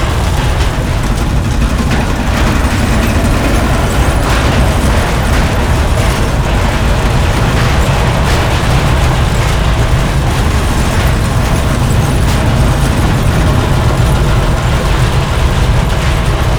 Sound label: ambience disaster rumble